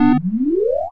Plug In; Insanely Twisted Shadow Planet

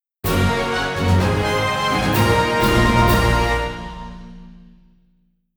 Victory!; arc rise fantasia original soundtrack

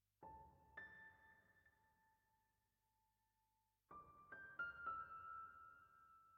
Piano; Frozen Flame; windows

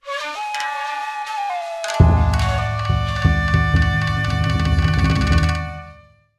Biwa Tune; Samurai Shodown; Samurai Spirits 3; Blades of Blood; PlayStation

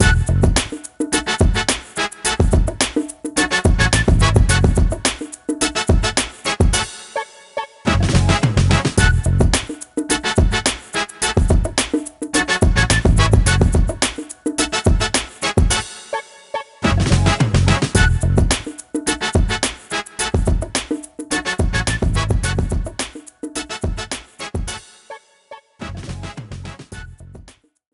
Victory Dance; drama queens 2009 nds gamerip

